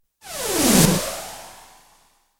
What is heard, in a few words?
Missile ricochets left to right; War; BBC